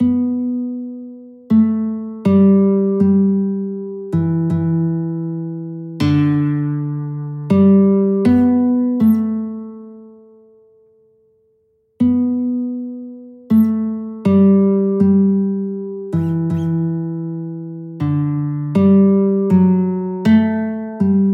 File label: guitar; nearer my god to thee